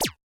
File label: laser; firo runner; sfx